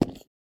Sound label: footstep